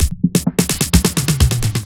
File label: Fills 128BPM, Clubsounds